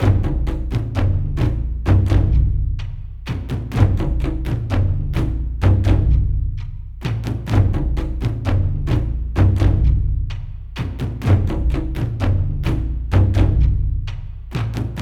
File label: Drums; For Honor